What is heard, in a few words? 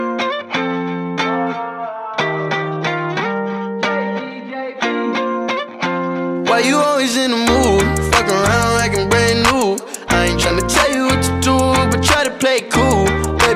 remix english ringtone